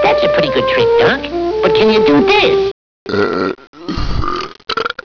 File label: burpy